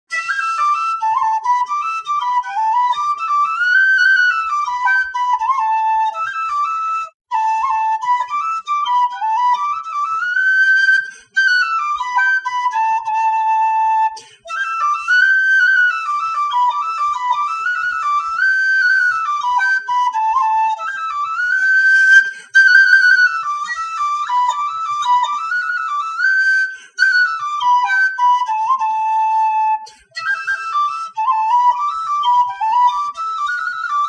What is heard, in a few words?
Whistle